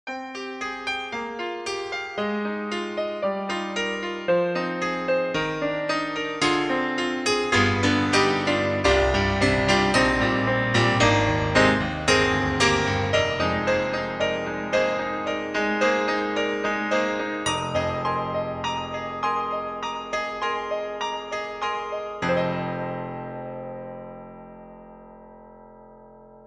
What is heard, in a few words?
Bechstein classic pop; EastWest; Quantum Leap Pianos